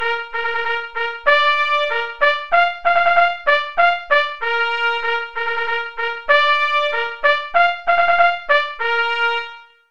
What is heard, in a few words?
Bugle Call Recall (qn=96)